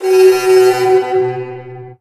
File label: alarm buzzer